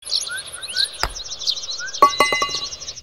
Golf message; Nokia Ringtones; Audio themes

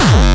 BOMBO, kicks, Hardcore

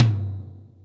Yamaha RM 50 Toms

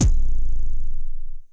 kicks, Bass Drum

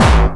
bombo, kicks, Hardcore

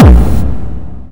Hard Kick

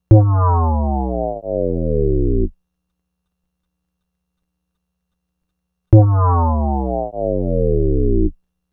Fall

